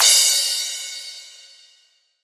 crash cymbal single shot d key; drum sample

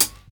airy closed high hat sound d sharp; hat samples

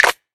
clap single shot a sharp key; single hits one shots

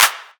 good trap hand clap A key; percussion

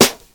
Old School Sizzly Hip-Hop Snare Drum Sound G Key

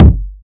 smooth bottom end steel kick drum g key

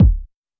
2000s warm sounding bass drum sample c sharp key

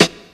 loud acoustic snare e key

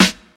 00s crispy hip hop steel snare drum g sharp key

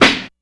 piercing steel snare drum sound f sharp key

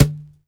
Boomy Acoustic Snare D Key

